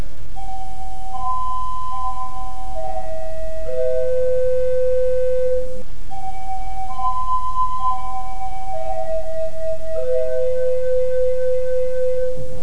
A short passage played on a 4' flute, first without tremulant, then with tremulant; organ